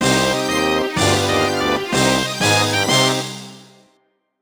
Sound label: Door Unlocked; Banjo-Kazooie